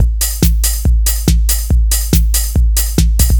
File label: Dance Drumloop; Drums; Dance Beats